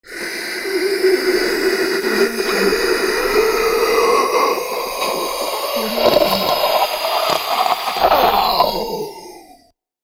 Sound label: monster agony